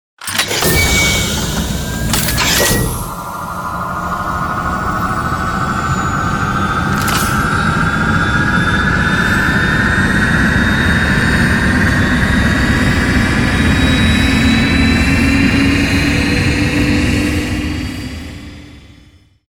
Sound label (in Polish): rakieta; karty obrazkowe; w kosmosie